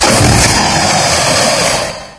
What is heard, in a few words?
strike, hydra; scmod